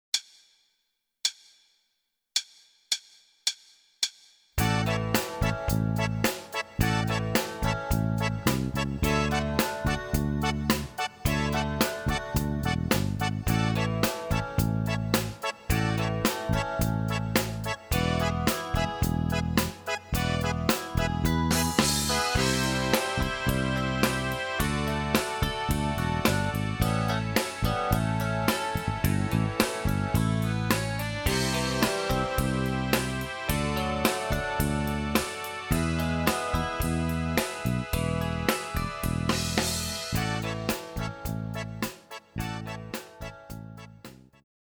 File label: Pattern Rock In 1/8